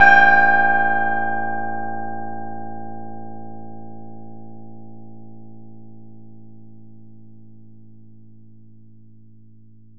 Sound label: crosscoupling, pianos; Modelling sound generation in pianos